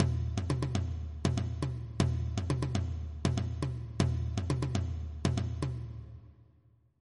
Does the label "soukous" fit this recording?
Yes